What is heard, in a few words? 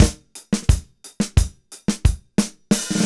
180, Mediteranean Traditions; Soundscan vol.53; AKAI